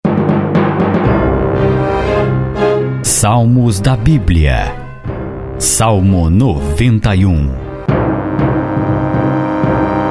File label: crisis scoring action